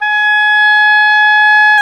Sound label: OBOE, Orchestral Winds, Oboe mf f